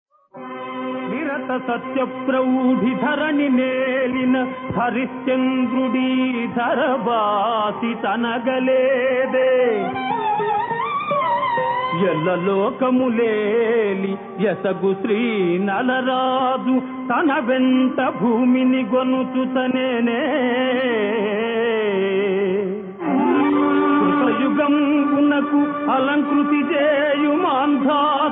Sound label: Padyam; KrishnaLellalu